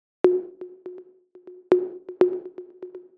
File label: 122 BPM Toms, percussion; MMB; Percussion Essentials